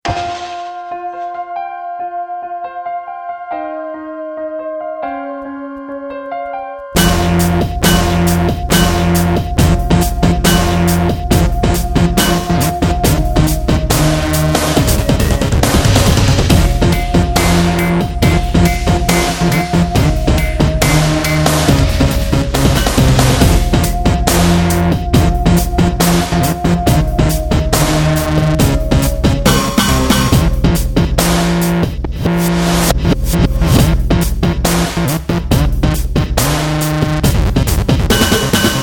dubstep; berlin

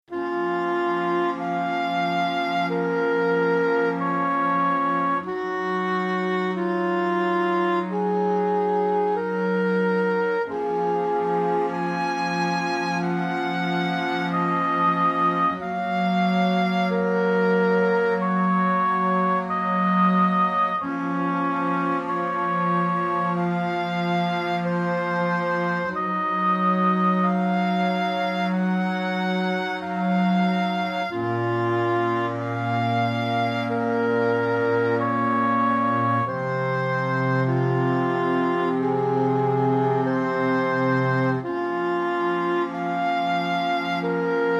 romance horns

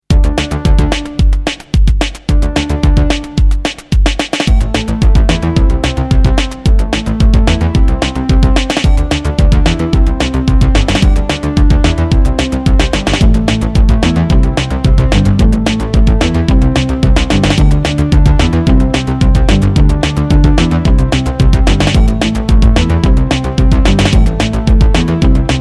fast electroland

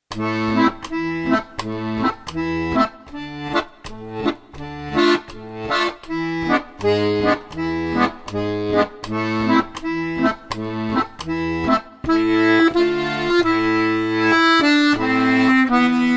blue accordion

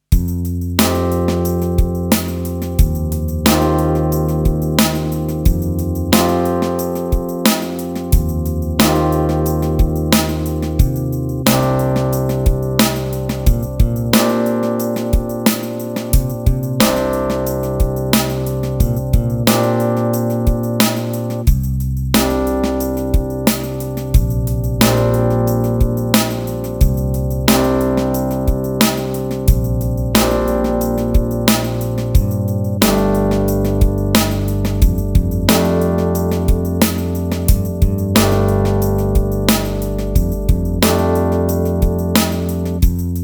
rain ride

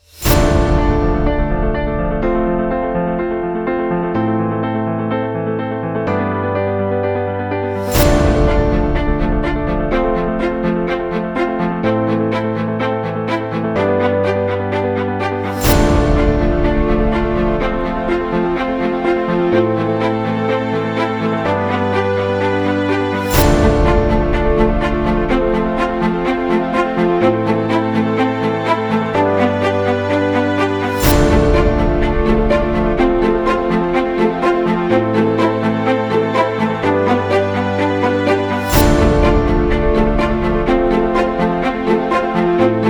underwater orchestra

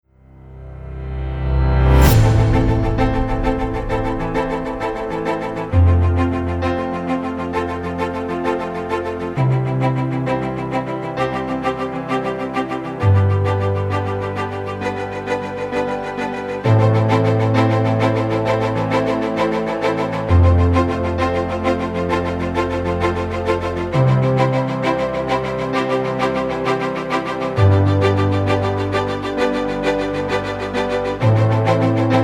Direct Corporate Orchestra

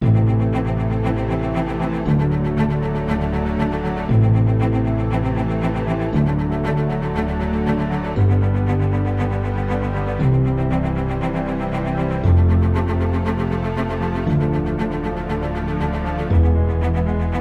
sun orchestra

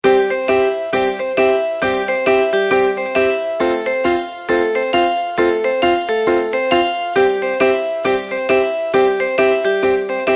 happy day without drums

